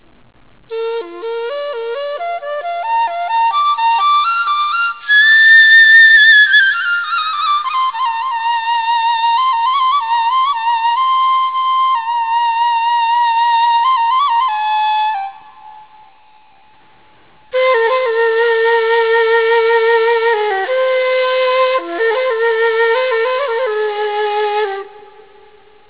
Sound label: TORK NEY